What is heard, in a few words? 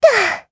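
Vox Landing Japanese; Erze; kingsraid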